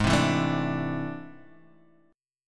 A Minor Major 11th

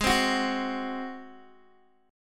Ab 7th Ab down Guitar Open D

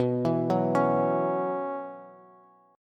B Suspended 2nd Suspended 4th, Bass; arpeggiated; JGuitar

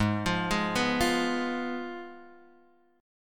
Ab Minor 6th Ab, down, Guitar, Open G

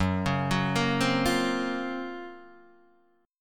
F sharp Major Sharp 11th, Guitar DADGAD; chordshape; down

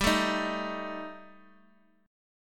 G Suspended 2nd Sharp 5th G, 4G, 5C, 5E, 5A; chordshape; down

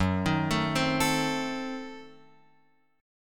Gb Diminished 7th, Guitar, Open F; arpeggiated; x,1,3,4,3,4; JGuitar

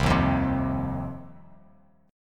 C Minor Double Flat 5th C down Bass Standard; Cmbb5 strummed; JGuitar

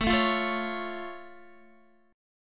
A Augmented A down Guitar; strummed; JGuitar

